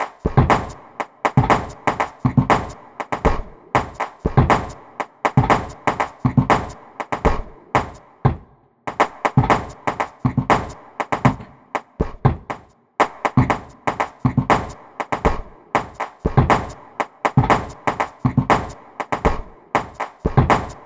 XLN Audio Life Pferdehufe

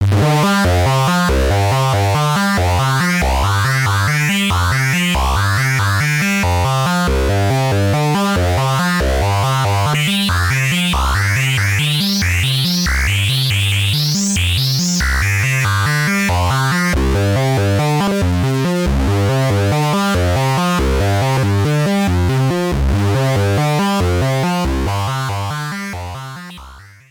16 tri 4 ring verschiedene Pitch Einstellungen; Behringer K-2 MK 2

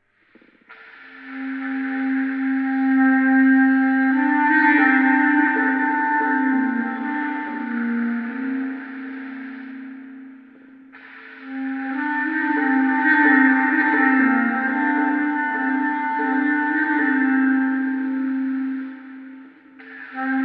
Bassklarinette Reverb

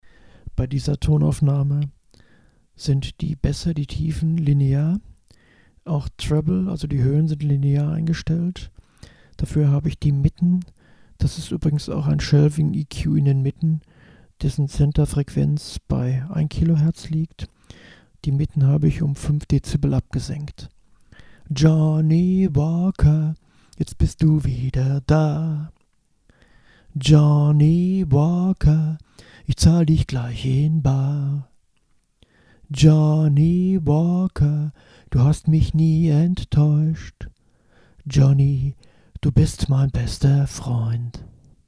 Akustik Duo; selbst abmischen

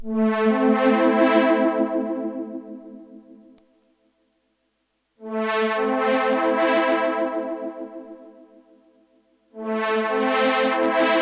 Death by Audio Octave Clang Synth Clean bis Fuzz

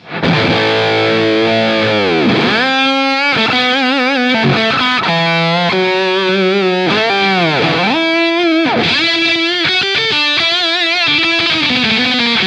Tropical Fuzz Nectar Alle Regler auf 3 Uhr; Beetronics Abelha

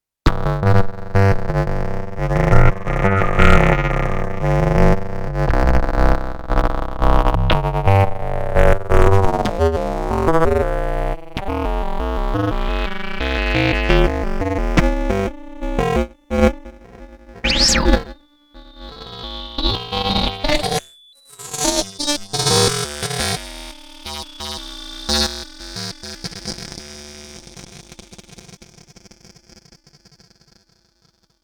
Frickelsound

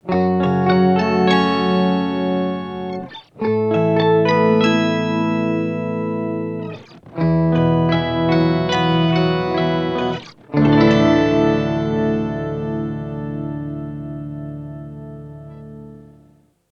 Harmony X2 beide Oktaven; Mooer

